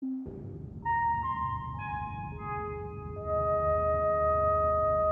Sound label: close encounters of the third kind tones